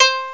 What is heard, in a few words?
koto; Amiga Special - Software Hits; orchestra; keystring